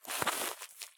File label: turn grass low; Escape from Tarkov